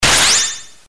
spell; patcheconwarsdx

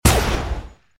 gunshot; thelastnight

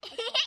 kid laugh; halloween

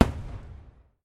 firework; pond5

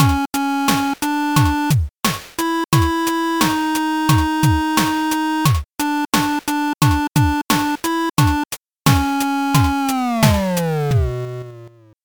death jingle; seagaia; ld22

